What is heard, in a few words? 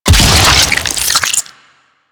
Cyst Explosion Single; Warframe; Infested Eris; Destructable Cysts